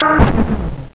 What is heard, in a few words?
train broken, ambience; xash3d fwgs